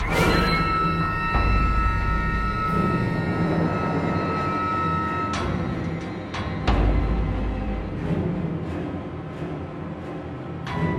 Music Tension

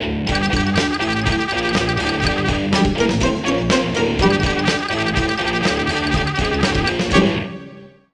Bari Sax; simpsons hit run; ps2

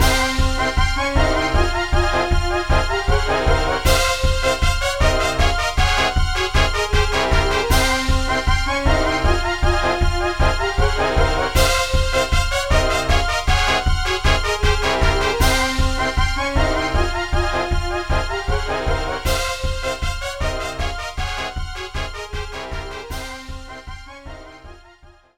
Boss Battle, Attacking; Pikmin 2

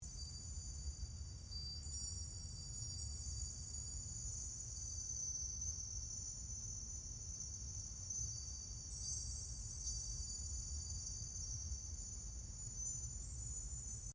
Floating Symbol Ambience; Quad Fronts4; Journey

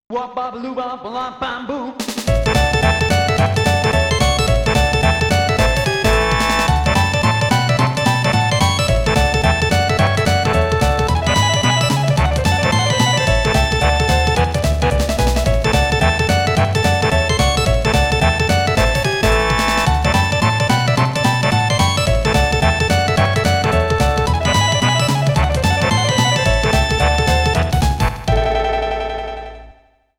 Octave House Rock

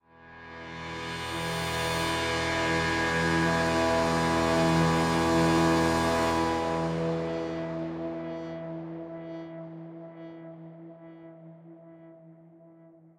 Outro Swell; Tron RUN/r

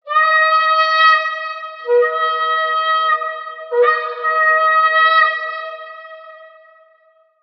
Fail Horn; Agatha; chivalry medieval warfare 2012 pc

